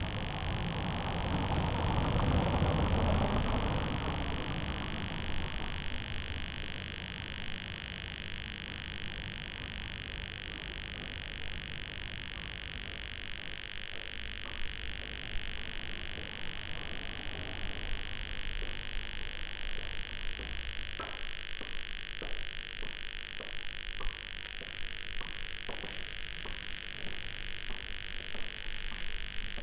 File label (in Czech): buzz kozacka